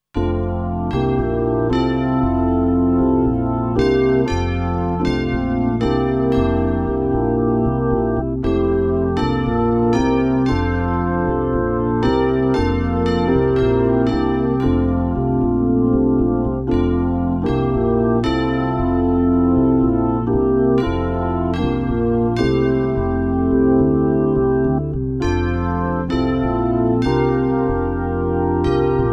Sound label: B3 Organ; Masterbits CLIMAX 8